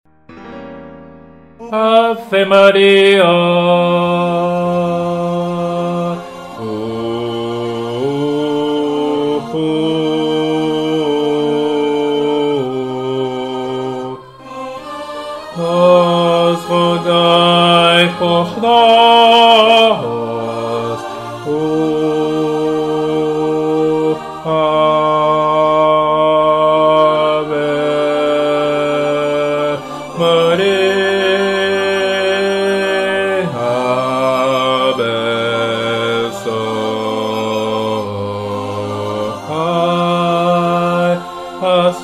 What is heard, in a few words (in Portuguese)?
ave maria baixo